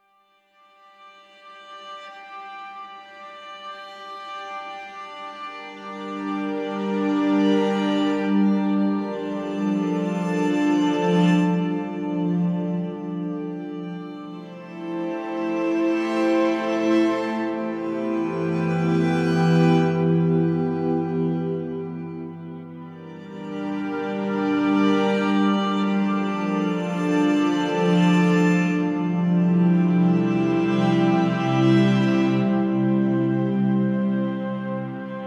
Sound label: vertigoviola